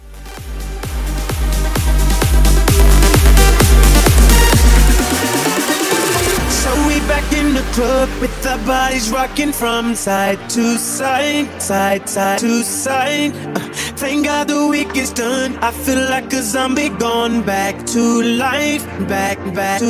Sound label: Hybrid Festival Mashup 130